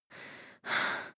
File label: breath female out of puff